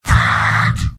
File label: heavy negative vocalization; mvm